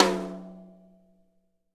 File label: tom, drum